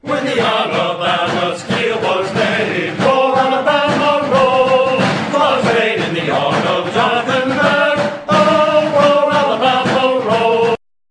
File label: roll